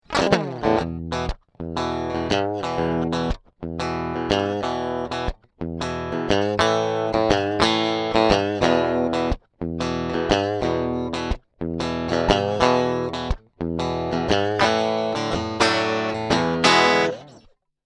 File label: Rock n Roll 57 Deluxe; fender